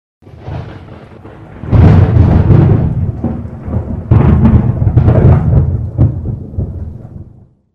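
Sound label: sound of lightning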